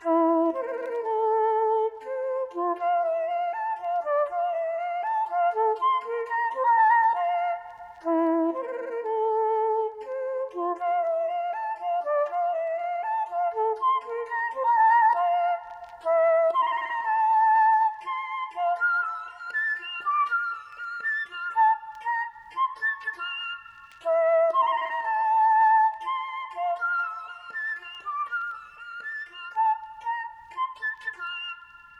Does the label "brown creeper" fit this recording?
No